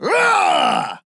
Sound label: Grunt Attack; Buck; Paladins